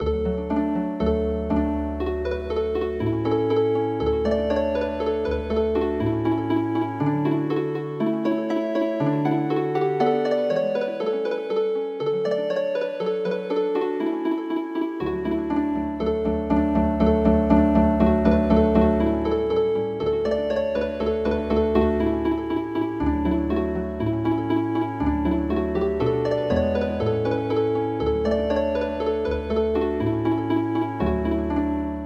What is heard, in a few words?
Healing Harp